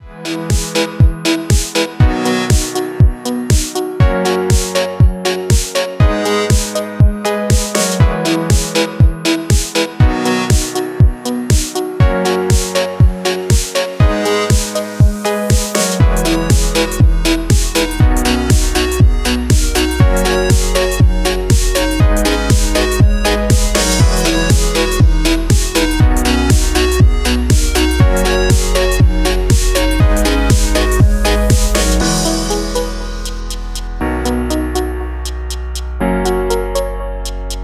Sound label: crushed guitar bounce; thegathering17; fast music